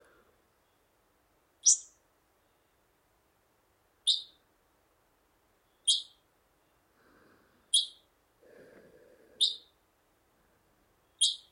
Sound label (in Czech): Netopýr rezavý